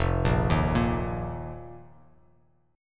F 7th Suspended 4th F down; arpeggiated; JGuitar

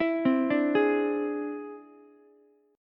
E Augmented 7th E down Ukulele; E+7 arpeggiated; JGuitar

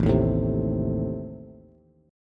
A Diminished A down Bass; Adim strummed; JGuitar

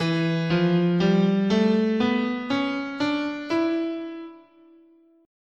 E Phrygian sharp 6, scale

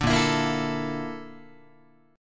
A sharp Major 7th Flat 5th A sharp Guitar; A#M7b5; strummed; JGuitar

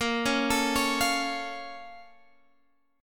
A sharp Minor Major 7th, down, Guitar, Standard; A#mM7 arpeggiated; JGuitar

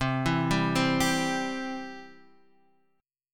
C Major, C, down, chord; arpeggiated; Standard; JGuitar

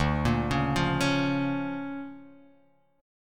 D Minor Major 7th Sharp 5th

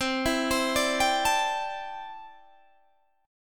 C 6th Add 9th C down Guitar DADGAD

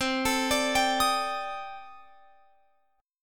C Minor 6th Add 9th, C, down, Guitar, Open F; arpeggiated; Cm6add9

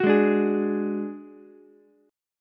D Major Flat 5th D Ukulele, chord; strummed; JGuitar

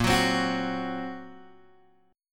A sharp Suspended 2nd Sharp 5th, Guitar DADGAD

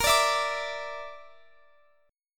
A sharp Major Flat 5th, Guitar, Open F; strummed; JGuitar

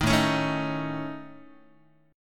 A Major Flat 5th, Guitar, AMb5 chord

